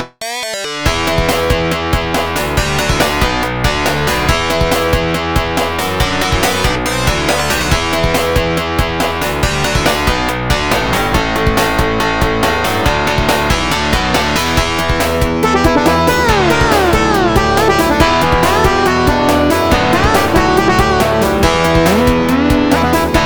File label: music intermission; duck game